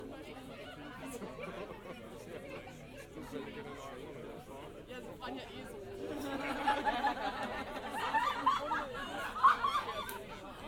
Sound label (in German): Heuriger; Lexikon-Roman